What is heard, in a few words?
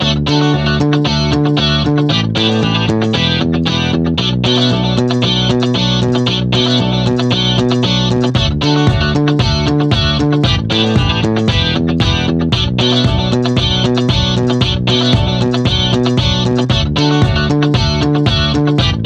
Disco